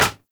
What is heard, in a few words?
Bow Impact Default; Weapon Impacts; Player; Bows; Envoy Hunter Bow; Environment